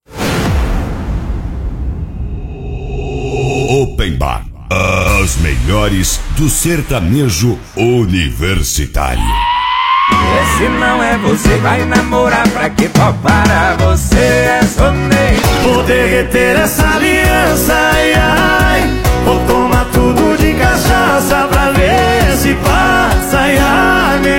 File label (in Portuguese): Open Bar Sertanejo